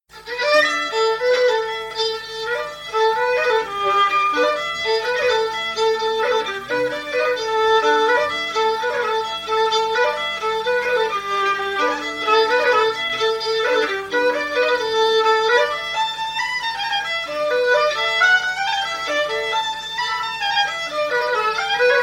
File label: pitched, world fiddle day; as i went out upon the ice; world fiddle day scartaglin archives